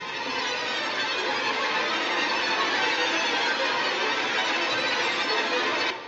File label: dissonent horror